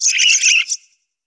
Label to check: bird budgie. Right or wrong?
Right